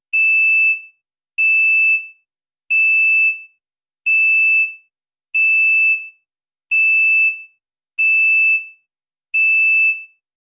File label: a loud beep signaling the end of a work shift; office